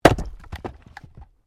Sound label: Wood Chopping Axe; Chopping (Sound Effects:Objects)